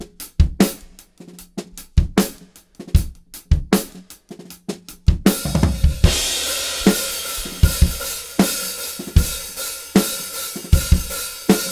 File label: drummer